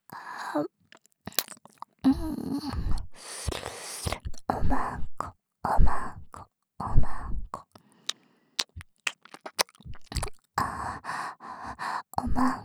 耳舐め; 右差分; お屋敷メイドのハーレム淫語ヘヴン; バイノーラルオナサポ音声

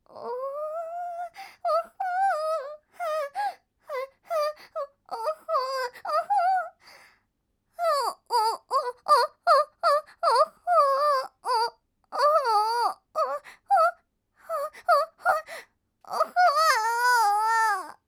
バイノーラル おぉぉぉ… おほぉ はぁっ

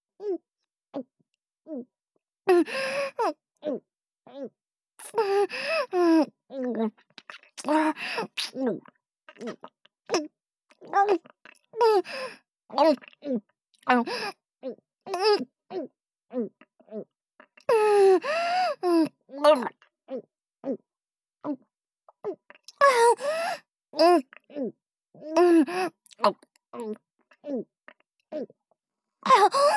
精液ごっくん ループ

